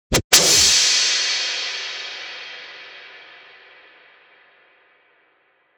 metal impact whip

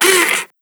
camera closing lens

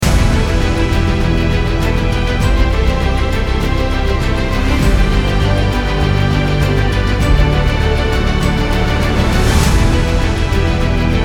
epic opener loop

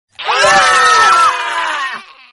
scream; bfdi

